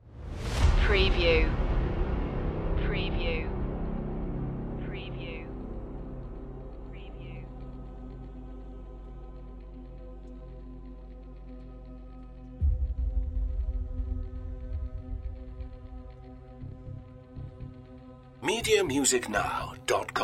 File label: perc and effects only